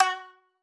marimba shamisen

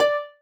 marimba rainbow; ゆめ2っき

